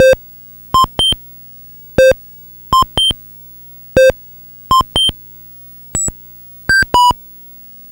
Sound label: Atari 2600 Synth